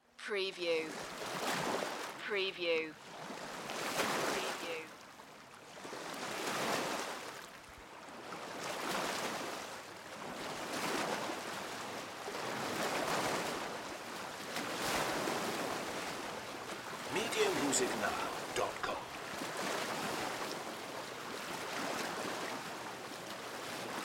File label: Lake Waves Splash; Sound Effects Bible